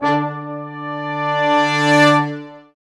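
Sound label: Sections, Brass Large Ensemble sfz; Roland LCDP06 Brass Sections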